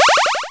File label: new element